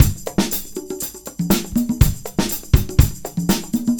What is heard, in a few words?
Combo, R&B Drums; Sonic Foundry (Sony Creative Software) - R&B Drums